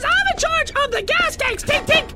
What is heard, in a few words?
Tink Tink